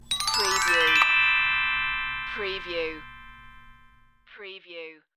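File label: Xylophone Ascend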